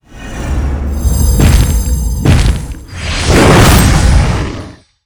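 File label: boss Anubis spawn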